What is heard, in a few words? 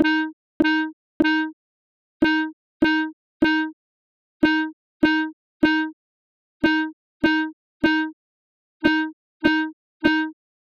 Clarinet vs Violin